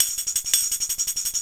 D&B Tambourine loop; Dance DJ; Percussion

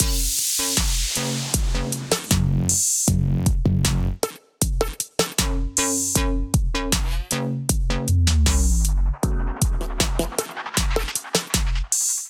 kit groove 127 bpm; Diz Night Kit

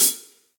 Hihat Pedal Cold Tight Room; Drum Hits; Standard Hits; Ableton; Factory Packs; Session Drums Studio

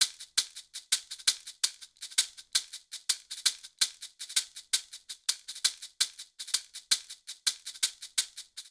Bembe Maracas-110bpm; Latin Percussion; Ableton; Factory Packs

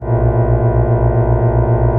Random Grain Drift; Ableton; Granulator III; Device Templates

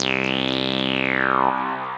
Acid Machine, synth lead; Ableton; Factory Packs; Punch and Tilt

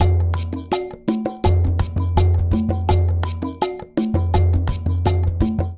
Rhumba Funk 166bpm, Latin Percussion; Ableton; Factory Packs

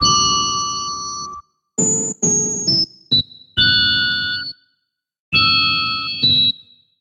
Whistle and Hum F# Minor 135 bpm; MIDI Clips; Chromatic; Ableton; Factory Packs; Voice Box; Transformed

